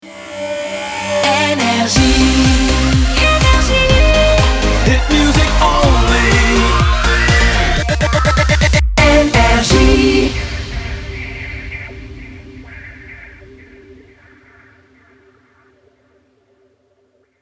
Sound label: jingle; NRJ